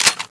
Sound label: xm2010 clipin